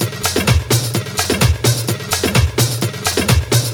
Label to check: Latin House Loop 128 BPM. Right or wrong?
Right